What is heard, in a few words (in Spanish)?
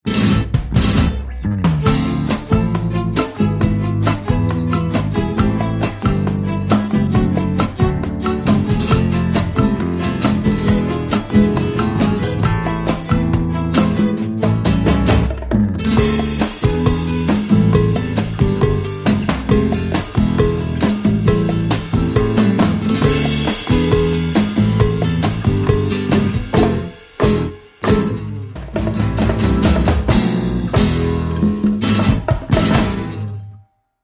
Guitarra